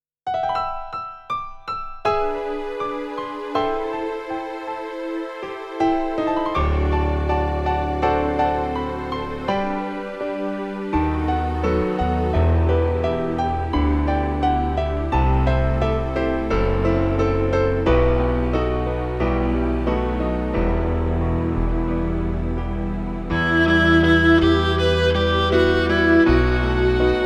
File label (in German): Instrumental